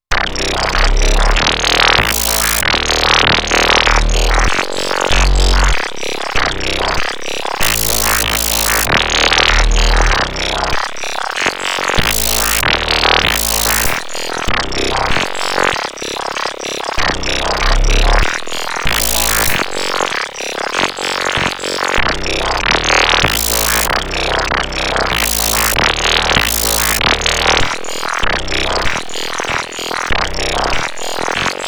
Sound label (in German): Dreadbox Polyend Medusa Parameterautomation